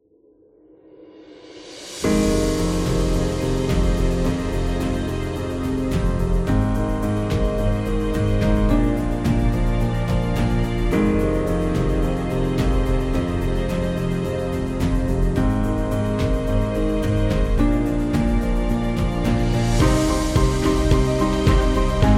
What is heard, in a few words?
Beautiful Luxury; healthcare